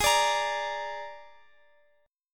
G sharp Suspended 2nd Flat 5th, Guitar; G#sus2b5; strummed; JGuitar

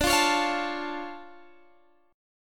D Minor Major 7th D down Guitar Standard; DmM7 strummed; JGuitar

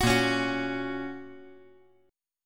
A sharp Minor Sharp 5th, down

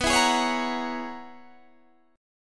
Db Minor 13th B

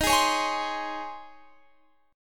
Db Major 7th Suspended 2nd Eb

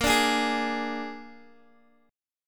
A sharp 7th A sharp, Guitar, Open D; x,13,12,14,11,x

